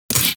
Shotgun Alt Mech; Weapons; Warframe; Tenno; Revenant Shotgun

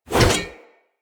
Heavy Whoosh, Swords; Weapons; Mockery; Mockery Brazard

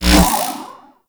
Kick Punch Whoosh; Warframe; Weapons; Corpus

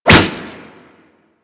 Whip Impact Default; Warframe; Weapons; Corpus; Impacts

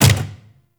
Turret Dry Fire; weapons; Lasrian APC; Warframe

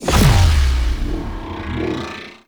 Cargo Train Exit Warp Portal Open; Warframe; Corpus; Railjack

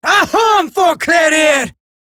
attack, female; en; Eximus Grineer; Battle Barks

